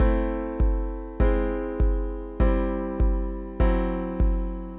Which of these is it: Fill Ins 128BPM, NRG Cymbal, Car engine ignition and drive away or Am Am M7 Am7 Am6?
Am Am M7 Am7 Am6